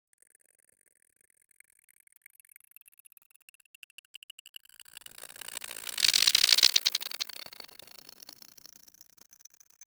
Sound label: a stone shoots up through, sand